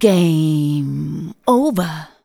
vox, Techno Trance; Ueberschall

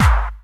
KIT, Dance Mega Drums; Best Service

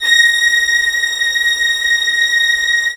A#6, LOUD VIOLINS; Q Up Arts; Denny Jaeger Master Violin Library

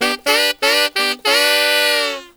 SAX, W. AFR.; Spectrasonics; Heart of Africa

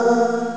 ohhh; ST-XX Amiga Soundtracker Sample Packs

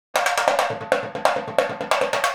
Indian Drum Fill; Sonic Foundry (Sony Creative Software); Essential Percussion; Drums

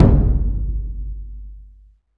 HYBRID, Heart of Africa; Spectrasonics; AF.HYBRID

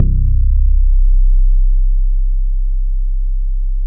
ROLAND JX8P, C1; Big Fish Audio - First Call Vintage Keyboards

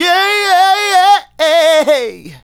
Male Solo, Vocal; Sonic Foundry (Sony Creative Software); Vocal Toolbox

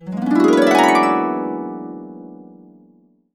E, Harps

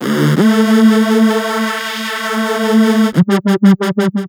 DC Korg Riff 173, breakcore; voxandchords

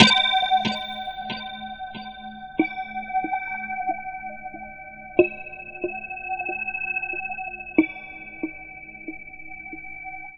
Chime; Sonic Foundry (Sony Creative Software); Loop Noir

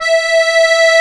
DIATONISC, AKKORDEON; Best Service; Alpine Volksmusik

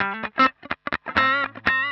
125 X wah A5, wahwah; PowerFX; Nu Jazz House

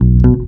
BEL; USB Soundscan vol.32; Roots Disco; AKAI

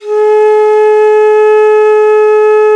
venu G#3, India winds; World Combos; E-MU Producer Series Vol. 7 – Old World Instruments

